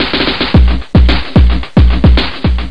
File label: 111 lofi break, DRUM LOOPS and BREAKS